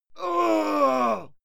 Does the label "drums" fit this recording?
No